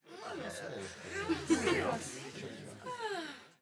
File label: crowd Chatter brothel; spellbound